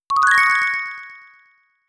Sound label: diamond sparkle